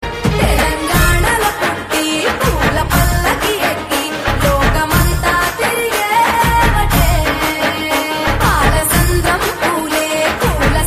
Bathukamma Song

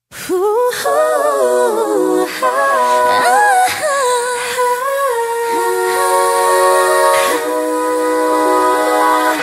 Woo Hoo

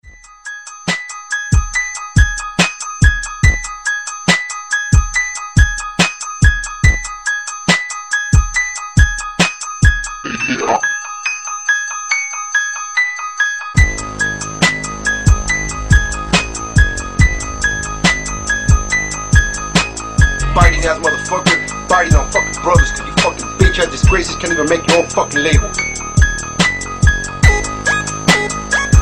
Piano Rap Beat

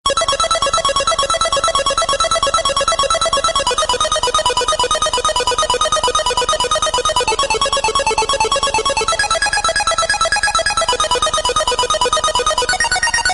8 Bit; Trip

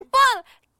phone; Jennie

